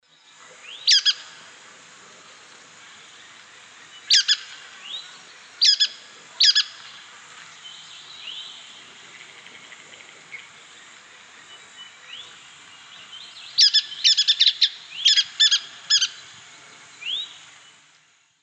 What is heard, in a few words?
brown headed nuthatch; paul marvin